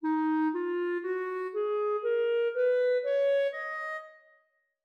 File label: nat minor D sharp 4